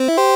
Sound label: SFX Good Event; life is a game android